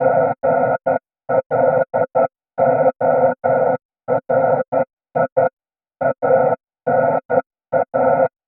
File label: morse noise